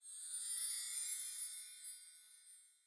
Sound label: reward; witch hunters stolen beauty windows gamerip 2012